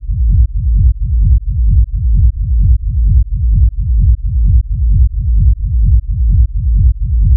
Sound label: Bass Loop 130 A, Techno; Ghosthack; Free Techno Sounds 2024